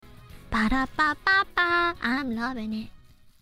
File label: autotune